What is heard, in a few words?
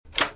drawer unlock